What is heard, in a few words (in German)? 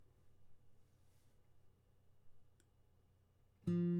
gitarre